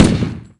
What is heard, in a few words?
assault rifle fire